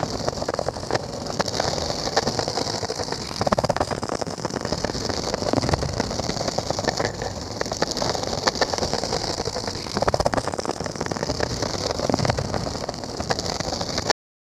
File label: sound of friction between, friction